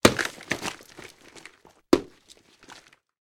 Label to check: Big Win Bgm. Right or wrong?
Wrong